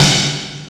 Metal Snare & Rev; percussion; SDs; SamplePool3

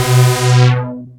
fat analog; instrumente

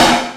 Snare; SamplePool3

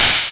Electric Open, HATs; percussion; SamplePool3

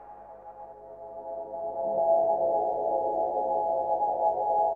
Atmo

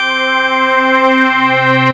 Church Organ Single; instrumente

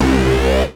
Lead

